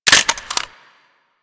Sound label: Uzi Reload Clip Out; Warframe; Tenno